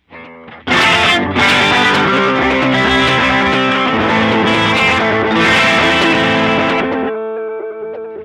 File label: Moogerfooger MF-108S Two Tape Flange